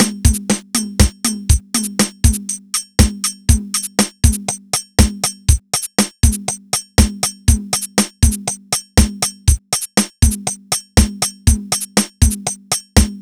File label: Good Drum